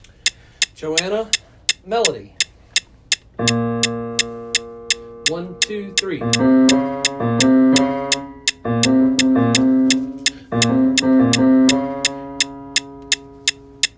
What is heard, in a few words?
melody